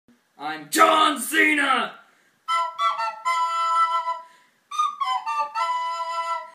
recorder; vine

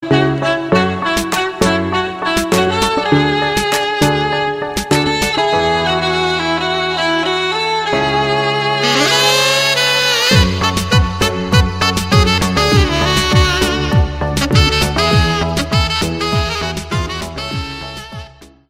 saxo

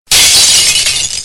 broken glass

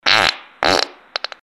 fart

